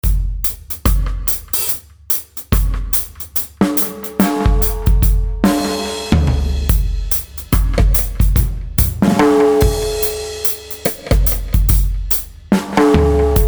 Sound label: dub